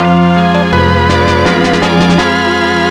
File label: Start Game Music; Spookware